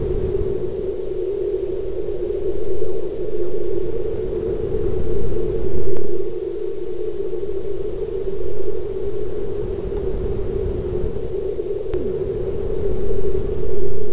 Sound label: WFR audio emfisis